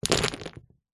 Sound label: leisure game jenga wood blocks tip out